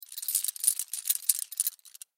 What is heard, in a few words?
Key Ring Shake, Key Ring Shake Jingle; Jangling; Foley; Blastwave